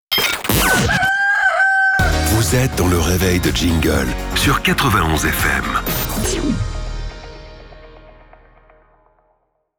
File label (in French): Sweep; 91 FM; Reveil de Jingle